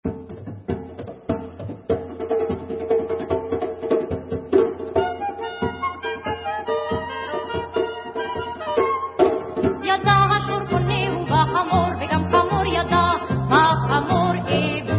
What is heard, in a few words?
Debka